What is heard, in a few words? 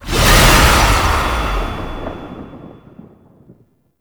Impiety Impact; Warframe; Dagath